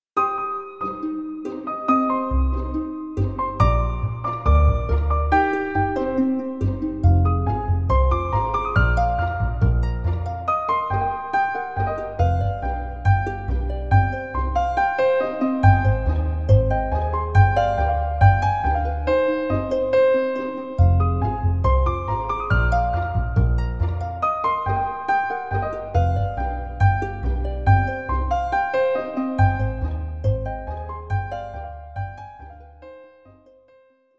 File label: piano